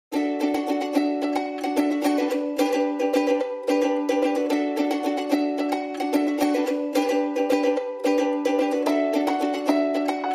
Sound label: Ukulele Piano and Winds Only